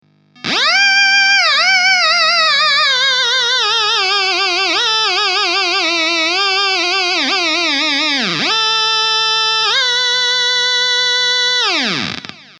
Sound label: theremin